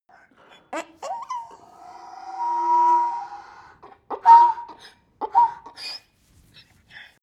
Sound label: donkey bray faint